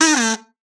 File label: kazoo short fall